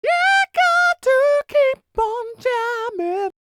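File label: falsetto, R&B Dance; Spectrasonics Vocal Planet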